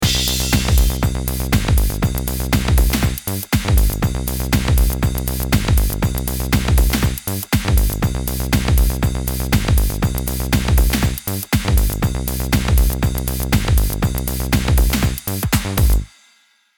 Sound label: Pinsel Bass